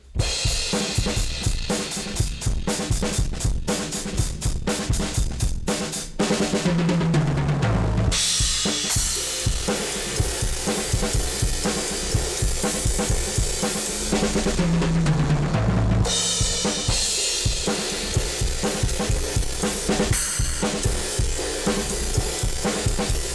drums Tube A

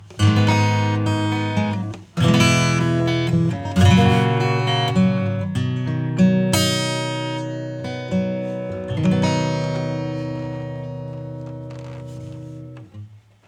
acoustic EQ

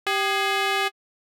Harmonic Shepard; Pigments

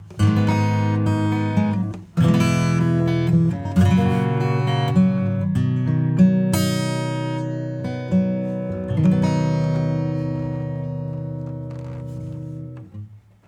Guitar Acoustic; smart:comp 2

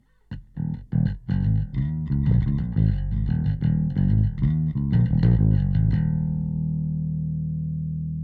bass; Neural Q

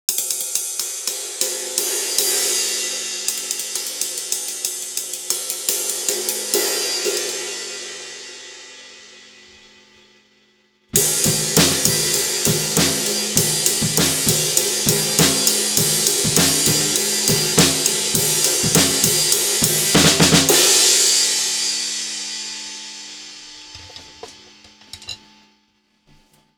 flat ride; Symbal formula 602

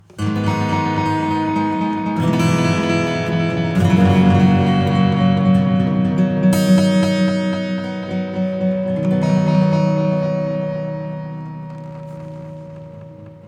acoustic; Cluster Delay

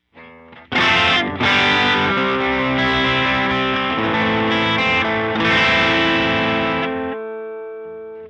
bypass Guitar

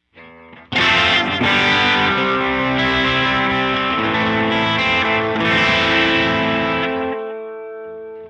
guitar Guitar Shimmer; PHYSION Mk II

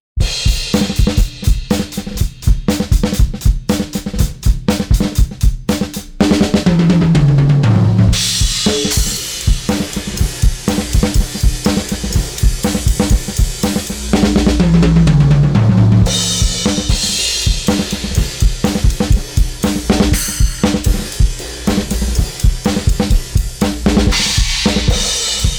EQ Tone Stack High cut; Kirchhoff

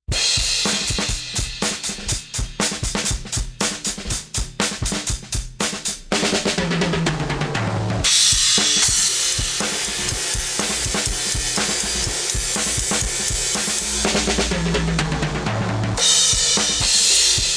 TAPE EQ Low Cut; TAUPE

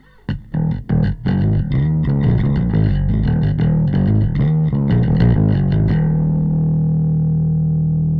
bass FAST

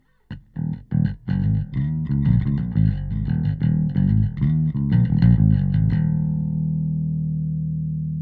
bass; SplitEQ

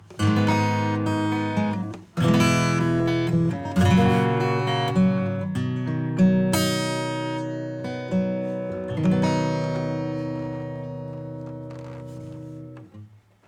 808 acoustic; SubBass Doctor; SAT 50

